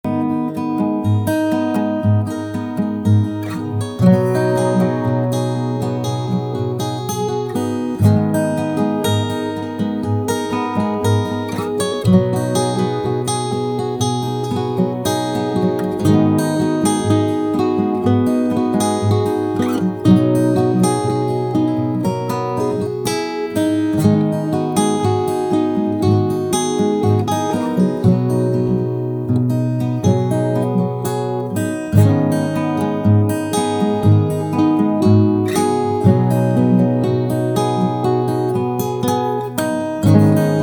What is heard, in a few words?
acoustic fingerpicking